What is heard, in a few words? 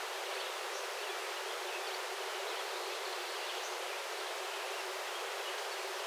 woodland ambient; nature